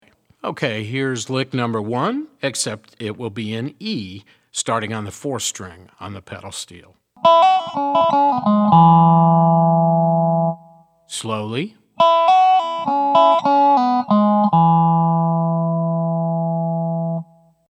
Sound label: resonator pedal, steel guitar